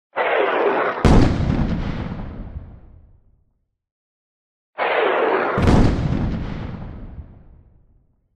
Whistle and projectile explosion; explosions

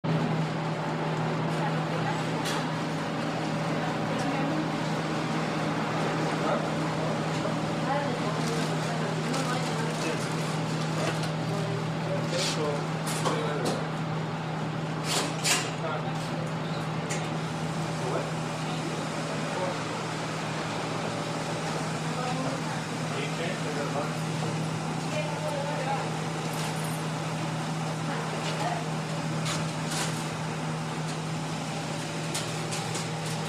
Laundry

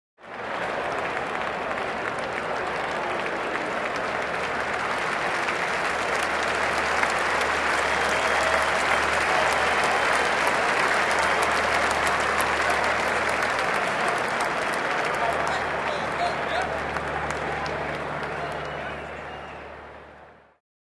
Cheers from the fans; standing ovation

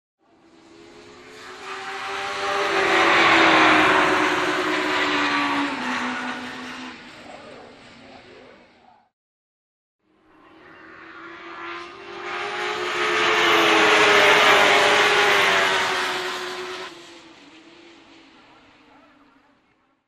Two ring motorcycles pass by; motorcycle racing

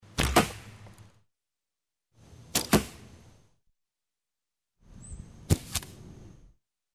Bow, arrow hits the target; cold weapons

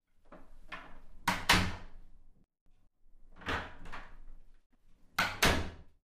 Wooden door opens, closes

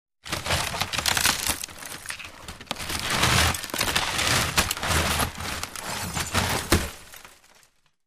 Breaking furniture, wood, blows, chips fly

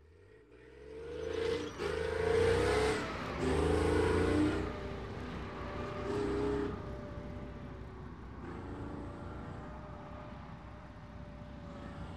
Tank shots while moving and turret spinning

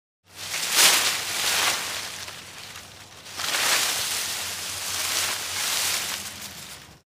Pulling a branch with leaves; wood

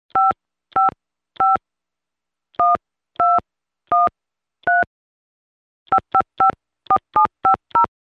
Tone dialing sounds on the handset; telephone

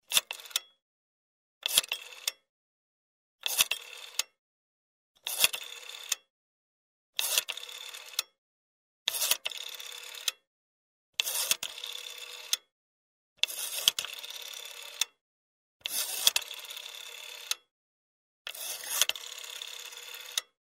Dial a number on a rotary telephone

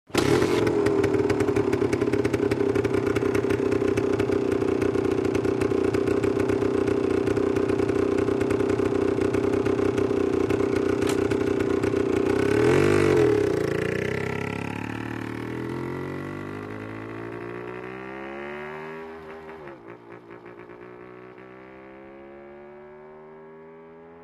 Motorcycle starts, waits, drives away

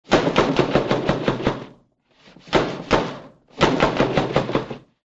door knock

